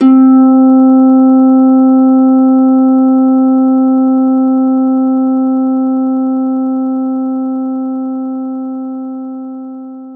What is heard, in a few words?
Orchestral Harp C4